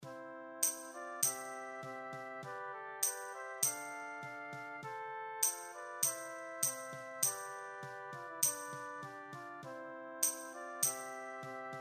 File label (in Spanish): PANDERO; Hércules